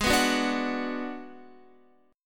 Ab Minor 7th Flat 5th Ab, down, Guitar, 4F, 4A, 5C, 5G, 5C, 6E; strummed; JGuitar